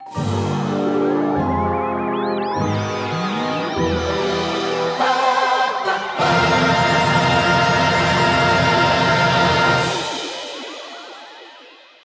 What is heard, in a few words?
Course Intro Fanfare; crash bandicoot series sound box; Rings of Uranus